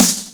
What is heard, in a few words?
snare; Breaks N Beats Sound Kit; Beatz Kit